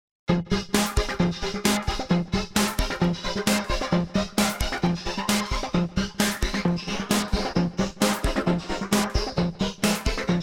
R3 Format Motion

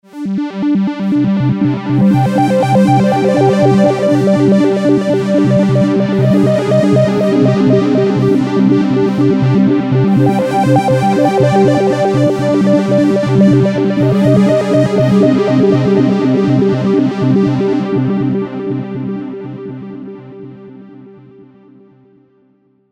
heaven mod spad

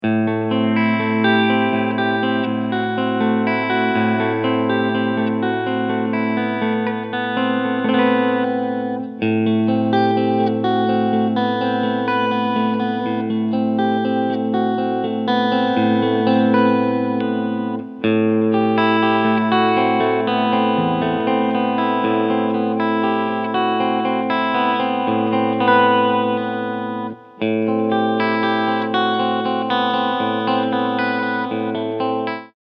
S 500 Alle PUs Clean Tone Knob unten; GL Tribute

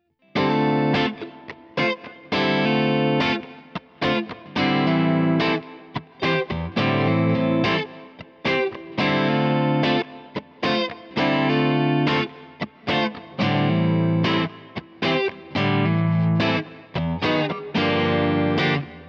Reverb Plate

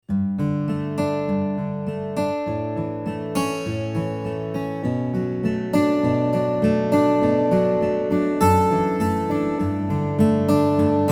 MODX Steel Twin Guitar AWM

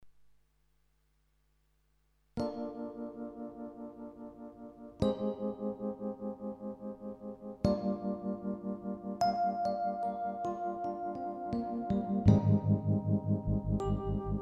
Coda Pro E Piano

